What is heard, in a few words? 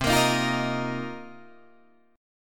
C Minor 11th C Guitar Drop D